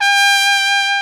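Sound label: SOLI Trumpet, Alpine Volksmusik, TROMPETEN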